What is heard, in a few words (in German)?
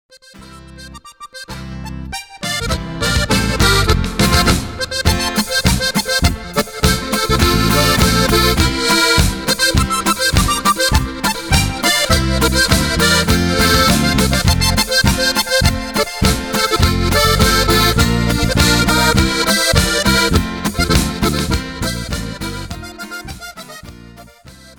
Jubilaeums-Boarischer